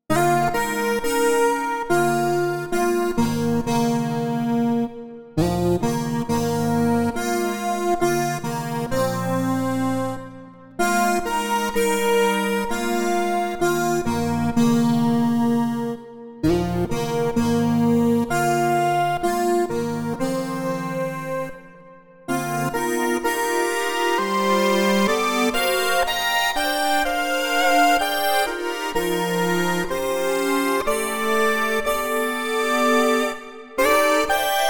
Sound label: FM Horn ewi Voicing